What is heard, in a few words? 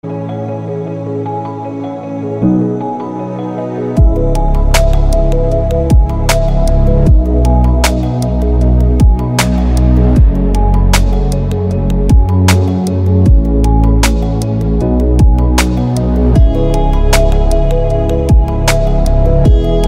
iphone x ringtone; reflection